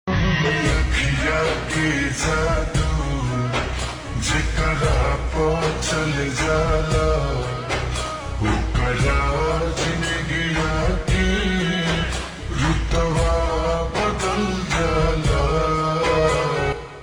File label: Ringtone slowed and reverb